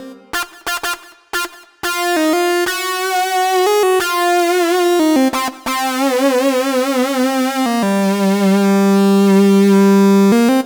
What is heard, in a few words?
Lead Synth Lick; Dr. Fink's Funk Factory